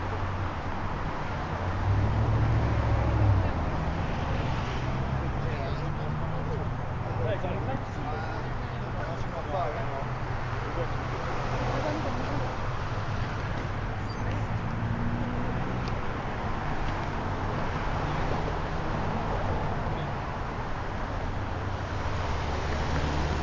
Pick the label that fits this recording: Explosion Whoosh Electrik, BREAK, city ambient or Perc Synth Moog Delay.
city ambient